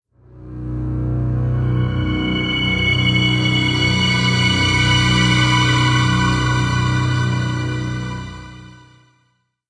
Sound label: Industrial Foreboding; Civilization Revolution; Xbox